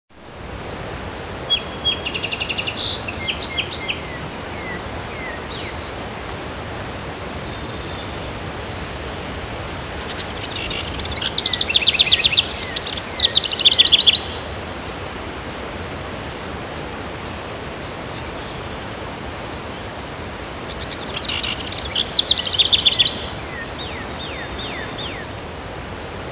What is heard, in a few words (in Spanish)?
Pradera (Ambiente); Abrapalabra La Magia de Aprender a Leer